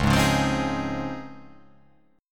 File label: C sharp Major 9th C sharp